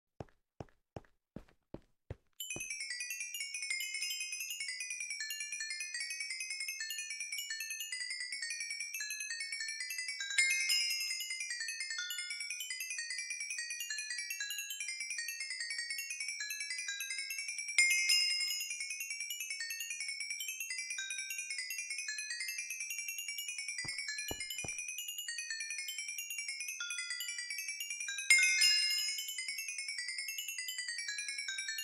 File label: Minecraft XP Sound